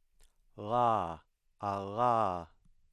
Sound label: voiced velar approximant